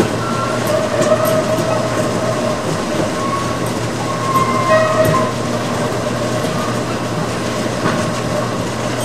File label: conveyor belt; Source Engine; Portal 2